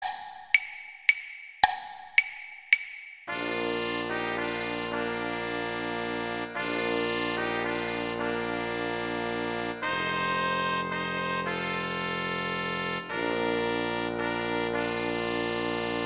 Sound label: Trombone; Gruber; Silent Night